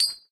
Ui Gaincoin

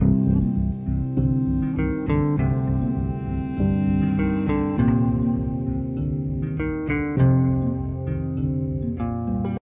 Tension Loop 100bpm 4bar; project zomboid

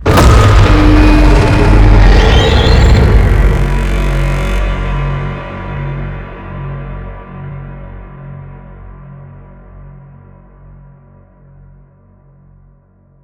boss explosion Blasts Crunch Echo; Devil Guns Demon Bullet Hell Arena; boss explosion base+ inferno boss Matter Mayhem

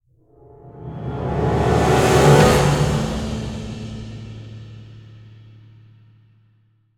stinger battle intro; roguebook

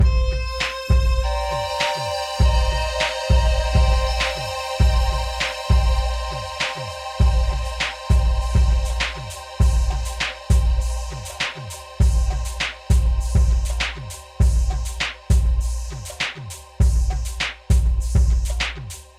oriental winds; Shut the Box; Wii U

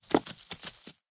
Adult Idle Jump

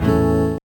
Fishing - Great; The Sims Bustin' Out; gameboy advance gamerip

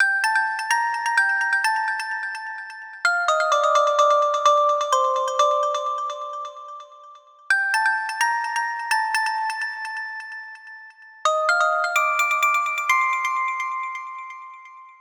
Melody; super crush k.o.

